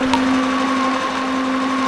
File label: WET, WATER; AKAI S6000 CD-ROM - Volume 1